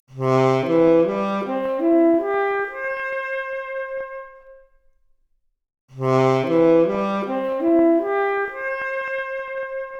Saxophone arp, Stereo output noised; 4bit

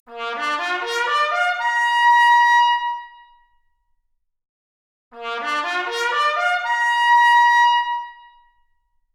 Trumpet arp, Stereo output; 4bit

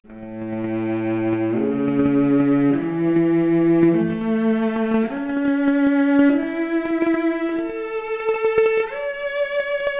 Violoncello arp, mp Stereo output noised, 3bit

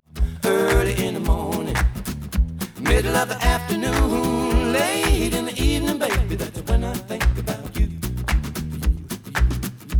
Stereo output noised; 4bit

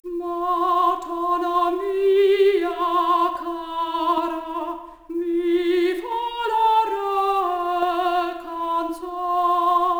Soprano Stereo output noised, 3bit

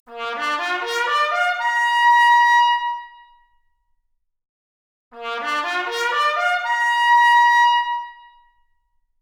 Trumpet arp, Stereo output noised; 3bit

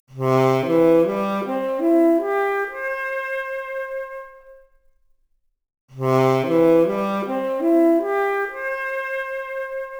Saxophone arp, mp Stereo output, 3bit

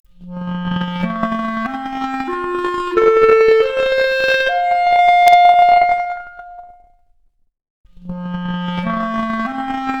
Clarinet arp, Stereo output noised; 3bit

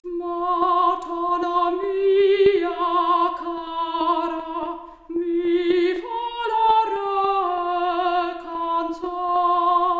Soprano Stereo output noised, 3bit

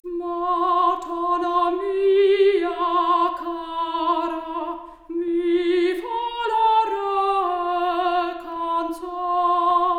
Soprano Stereo output noised; 4bit

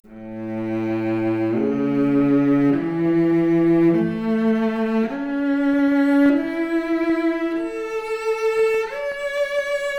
Violoncello arp, Stereo output noised; 3bit

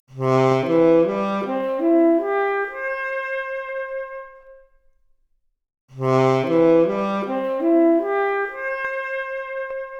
Saxophone arp, mp Stereo output noised, 4bit

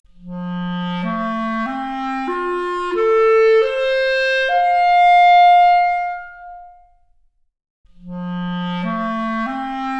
Clarinet arp, Stereo output noised; 4bit

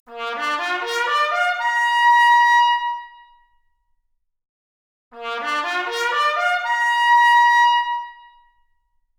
Trumpet arp, Stereo output; 3bit

